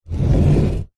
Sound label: household cupboard door wooden slide low frequency; zapsplat